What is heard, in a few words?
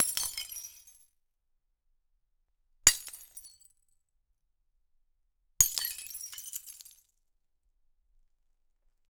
it starts raining glass, fragments